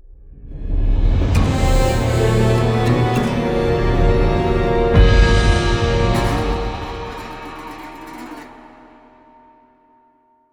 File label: Discovery light